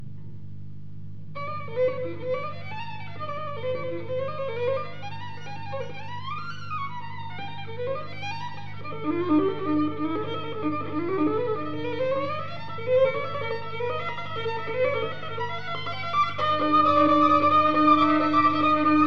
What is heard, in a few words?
Suite n 2 op 13 solo violin III movimento; Peter Hristoskov